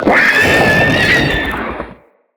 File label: Spider Vocal Death; Vocals; Root Spider Mother